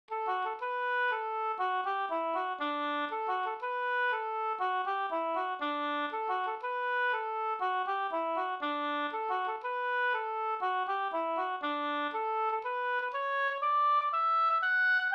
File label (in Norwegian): pols polskdans; fr Sorskogsbygda Elverurm no